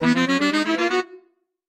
Music Saxophone Ascend

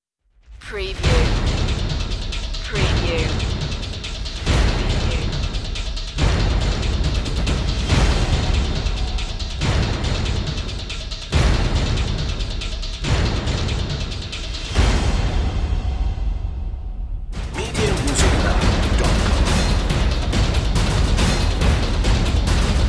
fire drums

